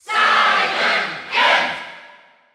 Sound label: Cheer German; SSBU